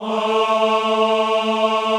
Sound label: AHH A2, Classical Choir; Best Service; Extended Classical Choir